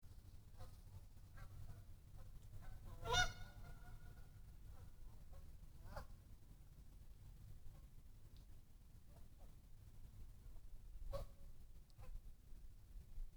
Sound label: Water Fowl Latenite